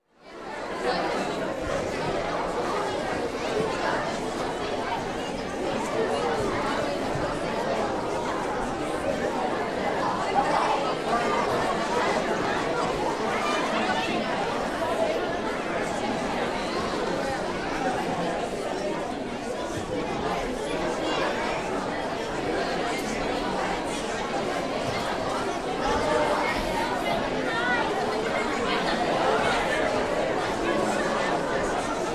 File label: Children School Auditorium - Awaiting Show's Be; Sound Ideas 2000 Series; Awaiting Show's Beginning